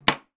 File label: hairbrush down on table; household